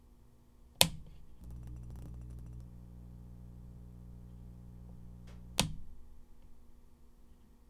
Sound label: Light Turning On Off